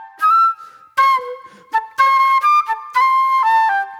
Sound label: Soul Plate Flute